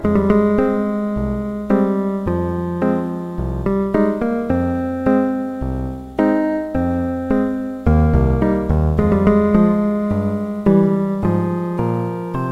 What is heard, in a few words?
bass